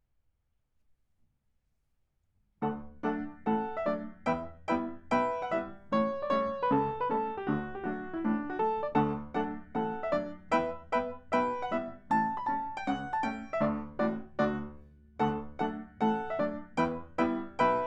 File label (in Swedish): schottis; riddarhyttan